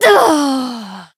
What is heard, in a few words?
damaged